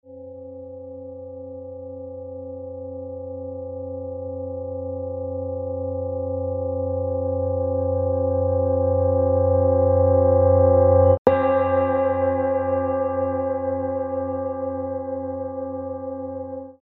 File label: tib bowl jupiterset